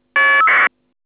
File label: FSK